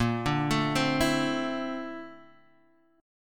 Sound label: Bb Major Sharp 11th Bb down Guitar Drop D, chord